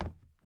plastic step; teardown; ps5 windows xbox series xs

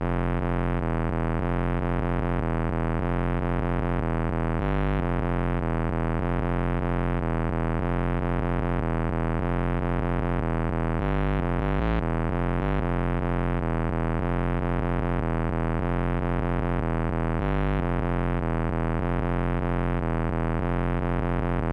Drone; Patchouli Drone

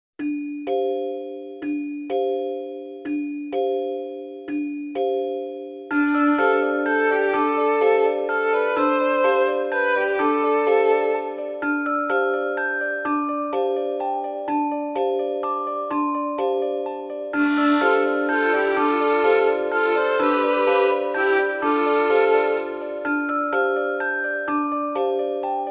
Asian Street; professor layton and the last time travel

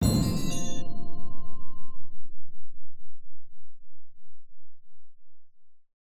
Combo Mid; Mixolumia

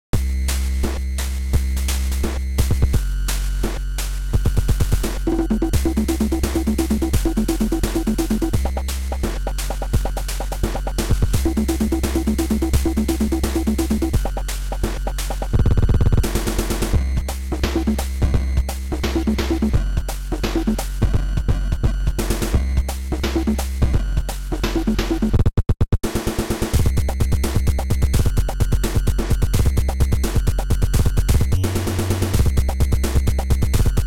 beatdown

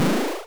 Item pickup; high strangeness; wii u

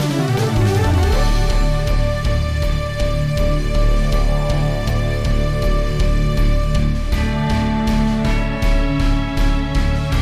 Battle Victory Theme; Tales of Innocence R; PS Vita

